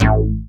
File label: acid bass; basses; The Sample Bank